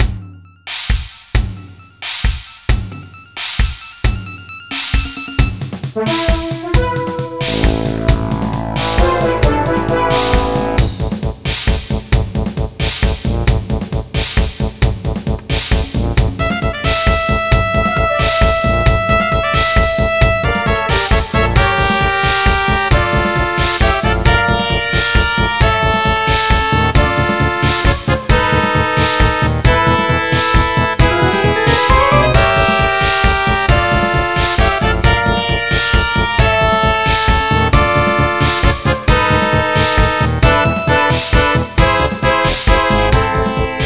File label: Win; Warcraft: Orcs and Humans